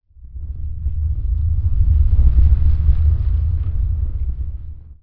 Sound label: geyser rumble